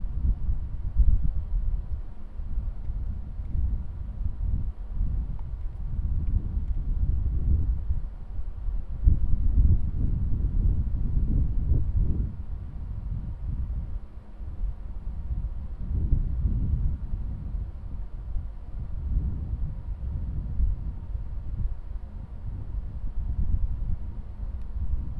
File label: V Windy Creaky Wood; fieldrecordings